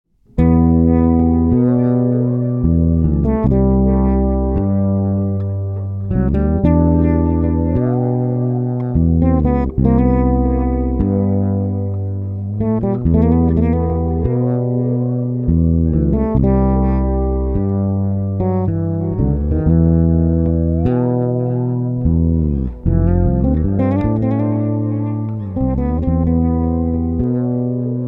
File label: fretless